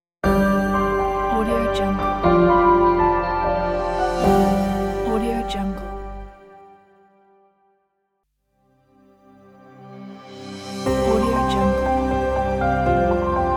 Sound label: piano logo pack